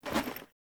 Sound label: Foley Action Armour Melee Swipe; Player; Deora